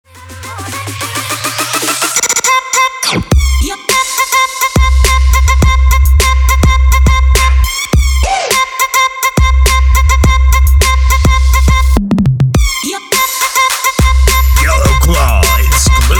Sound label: drums remix